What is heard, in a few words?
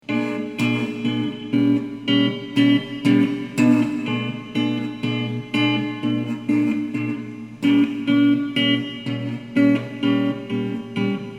jazz blues